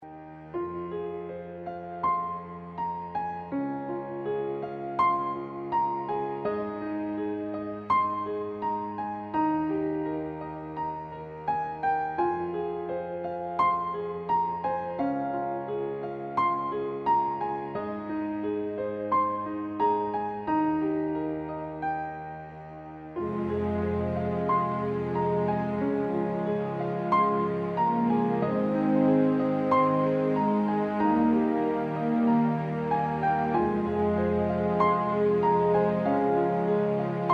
alarm ringtone

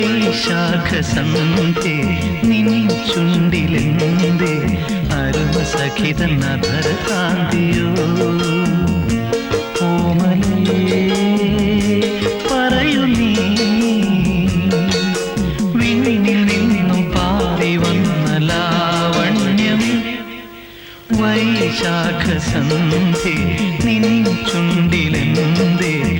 MALAYALAM RINGTONE

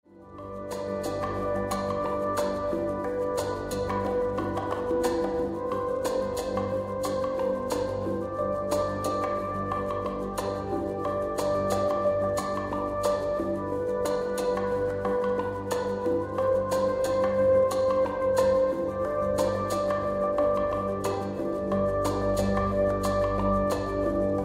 SOOTHING SOUND OF ALARM TONE RINGTONE

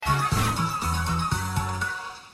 WIN GAME SOUND; ANGRY BIRDS

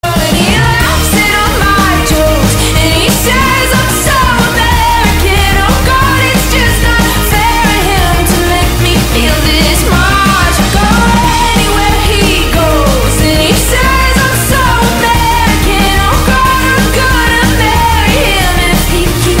RINGTONE

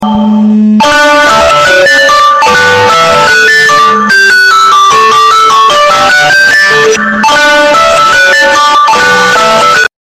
LOUD ALARM RINGTONE; SAMSUNG

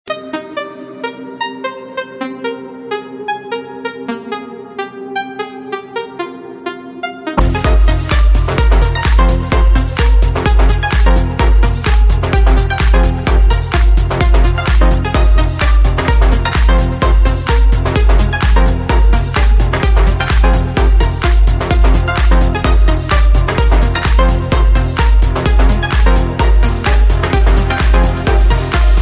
DOWNPIPE RINGTONE, INSTRUMENTAL

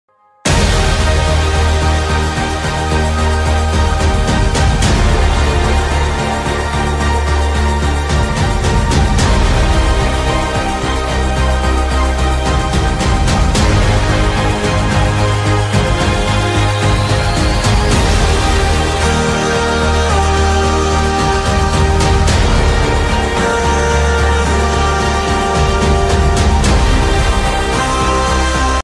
game ringtone; BUMBLEBEE TRANSFORMER

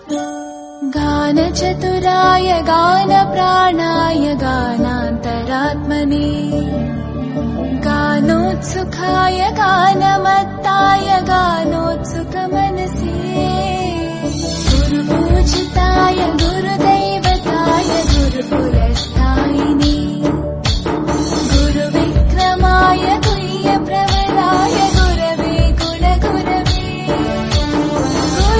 FEMALE VERSION RINGTONE; BHAKTI

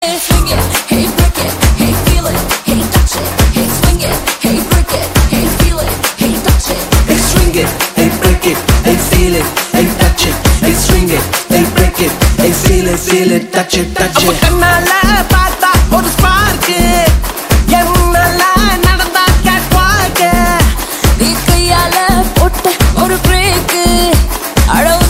TAMIL SONG RINGTONE; THE GOAT

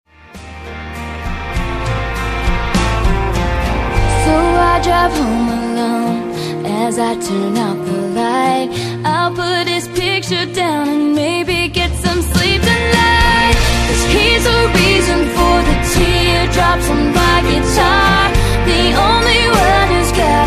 GUITAR RINGTONE, ACOUSTIC GUITAR

